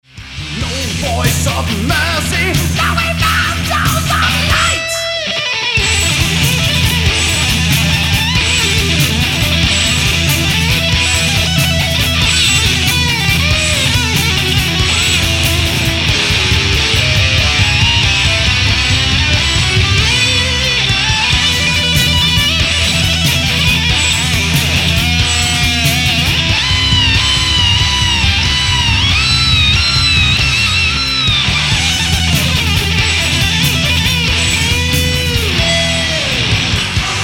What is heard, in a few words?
solo